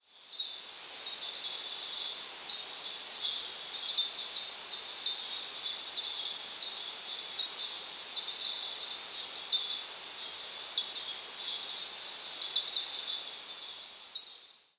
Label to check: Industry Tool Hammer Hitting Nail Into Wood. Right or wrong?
Wrong